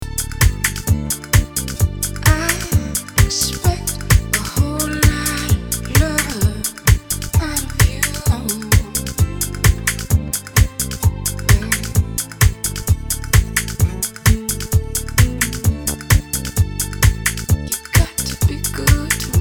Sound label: Vocal